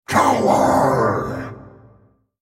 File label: ultimate effort; HecarimArcade; Character Voices - English